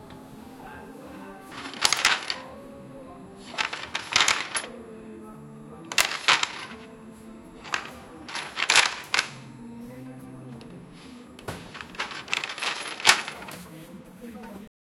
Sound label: soviet totalitarian atmos, flipping pages